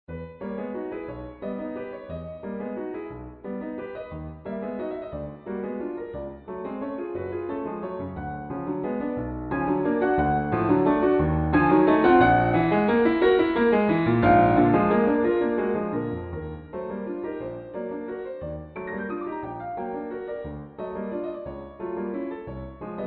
Waltz in E major

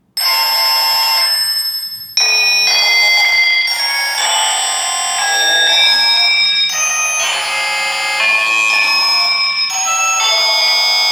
Shattered Glass; Samurai

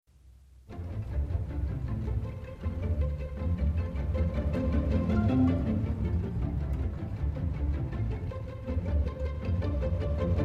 Tchaik 4 pizz, strings; orchestration introduction to strings

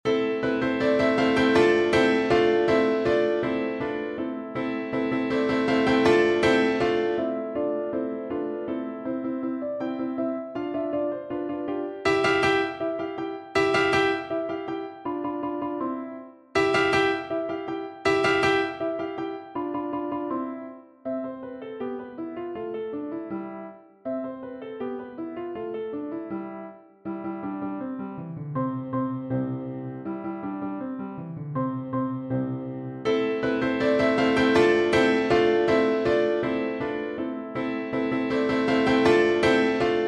Piano

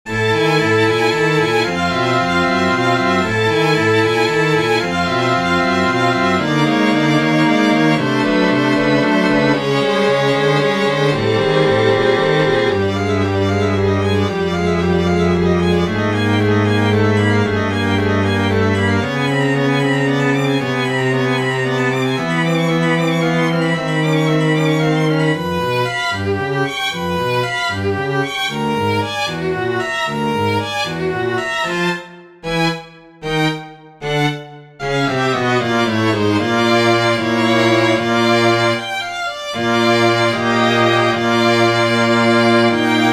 String Quartet

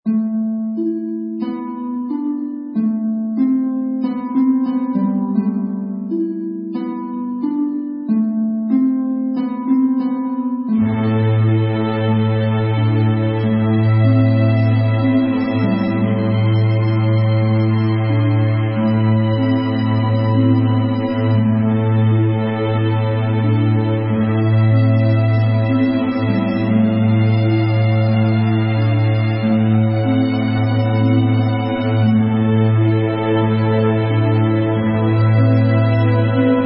Harp

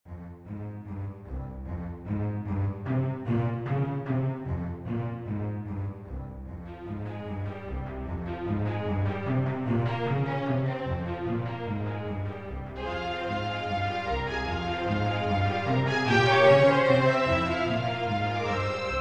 Piano Concerto Octaves

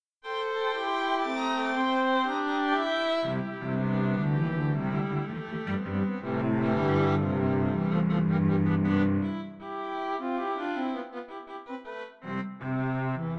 Three Sententiae for String Octet

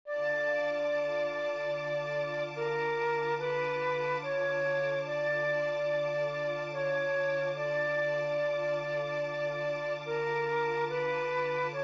Orchestra

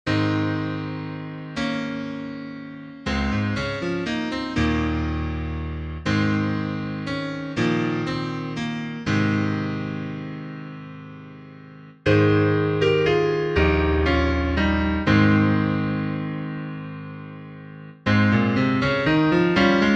Prelude

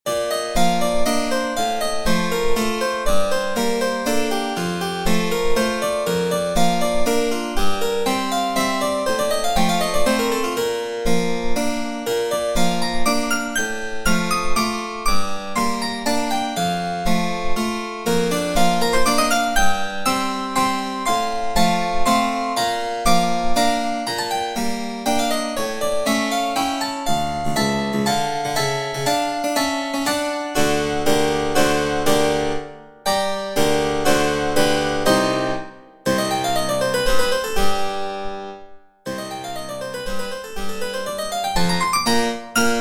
waltz

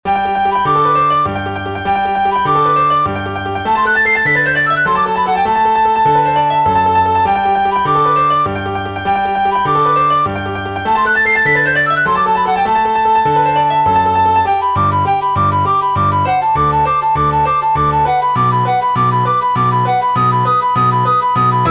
Recorder And Keyboard

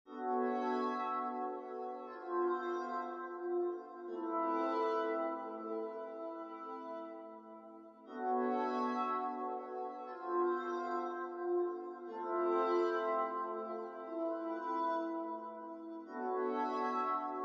Lament, FM Player Crystal Ocean

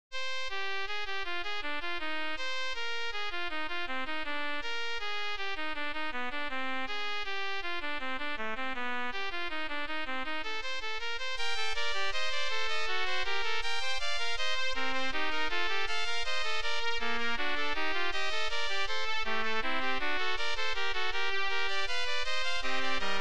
fugue

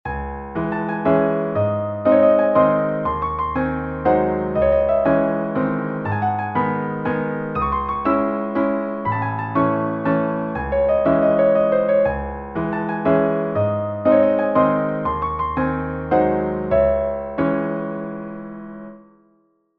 Mazurka in C Sharp Minor

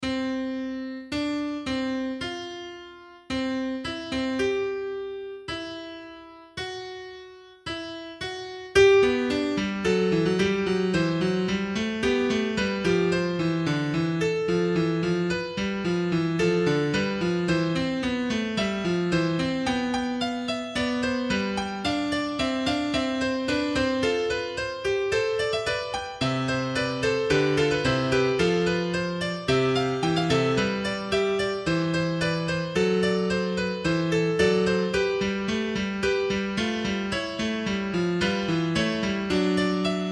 Fugue

